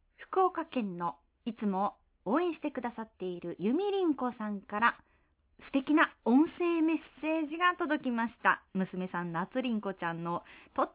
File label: SoundScape